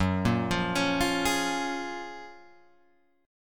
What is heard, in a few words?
Gb Major 9th, down, Guitar, Open G